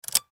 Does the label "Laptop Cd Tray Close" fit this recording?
Yes